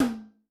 Tom, Yamaha DTXpress II

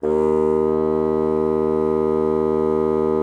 bassoon, D#2; Xsample VOL-6

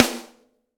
SNR HI SOL, snares; Roland L-CDX-01; Snares 3; Sn Modules 3

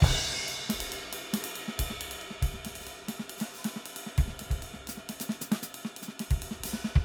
Straight 8th Jazz Loop 136 bpm, drums; BASEMENT DRUMS VOL.1

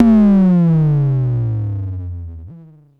KLONE BASS MOD, Klone Dual-Percussion-Synthesiser; Drum Machines

